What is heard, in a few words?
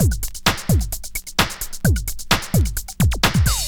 drum 130 electro, drumloops